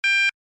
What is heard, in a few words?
alarm tone, sounder alarm tone; sounder beacon